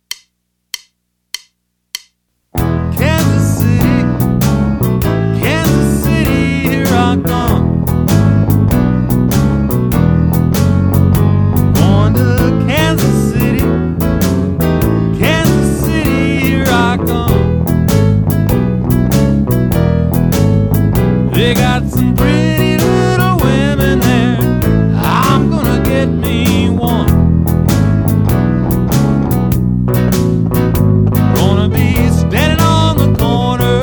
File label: Walking Shuffle In E Fast